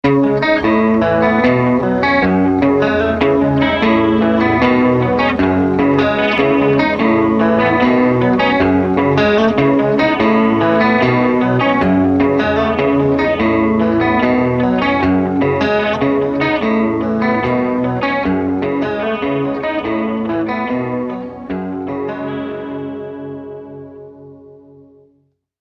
chorus, Stereo Chorus; Retro Sonic

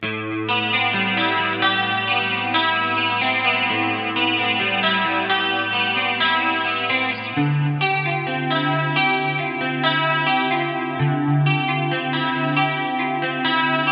ballad 80s; Damage Control

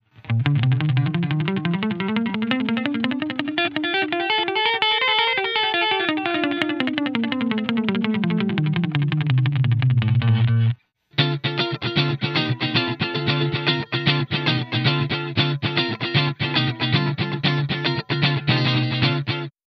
subdivide; Damage Control

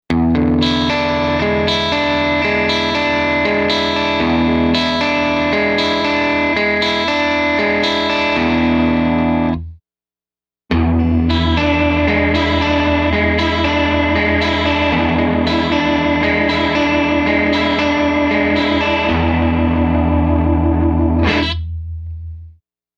chorus, Stereo Chorus; Retro Sonic

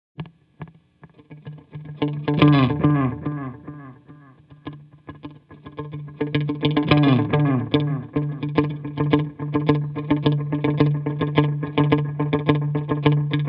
delay, Analog Delay; Retro Sonic

